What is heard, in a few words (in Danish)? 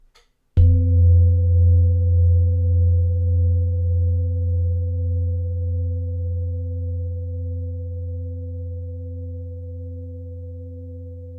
Klangskaal, klang og syngeskaal xl